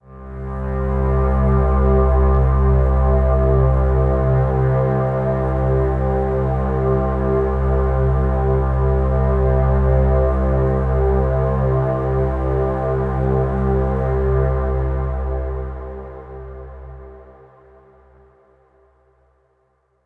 Downtown Overlay Drone

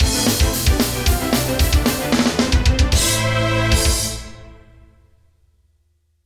Stage Clear; Castlevania Chronicles 3 Dracula's Curse OST 2024

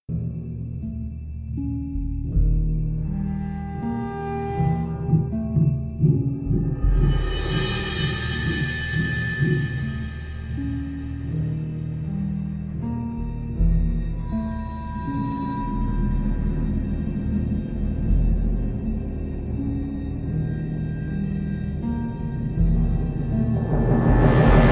Open Ocean & The Facility (Suspense); Jaws Unleashed

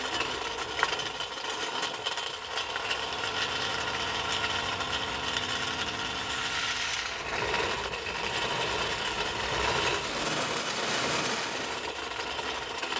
metal slide loop

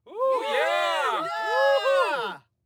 Cheer; The Princess and the Frog; wii windows

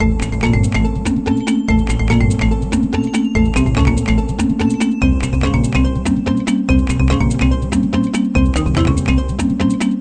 HurryUp, gamerip; Tumblebugs

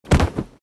Just Hurt Land

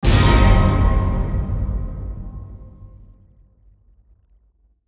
Hit; Flashback; PS3; Xbox 360

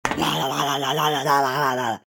Angry; troll face quest unlucky; android

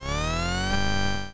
feedback

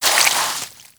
Wet Paint; The Princess and the Frog; wii windows; Hub Orleans; Draw Wallpaint; Wet Paint Brush

